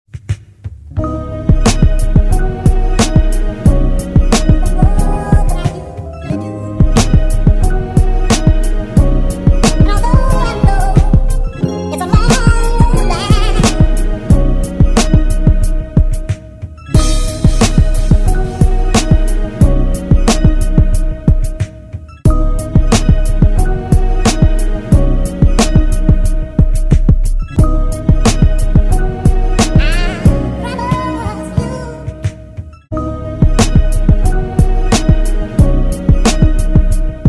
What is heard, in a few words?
instrumental